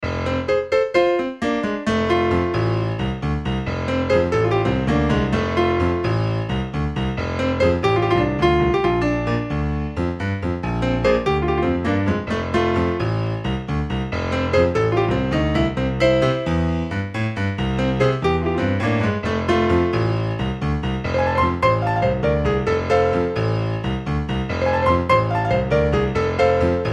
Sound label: Rocking Boogie